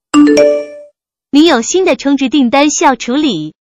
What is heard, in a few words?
recharge